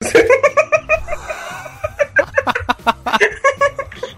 anime smeh

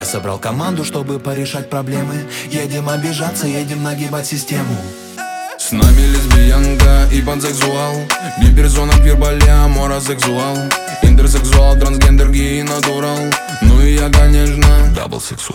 Na zvonok ili budilnik

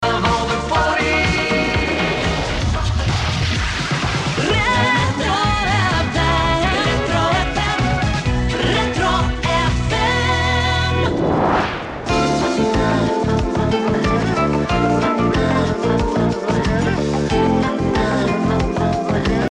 Dzhingl, Retro FM; Moskva 88.3 FM

